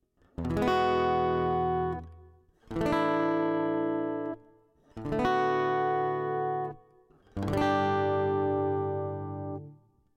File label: Chords